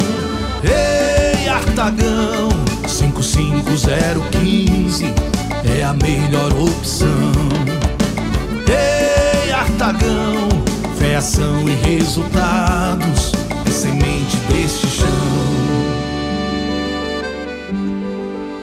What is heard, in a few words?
Jingle